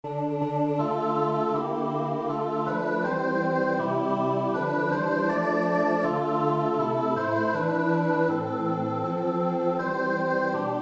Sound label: midi; twardowski; krzyzu swiety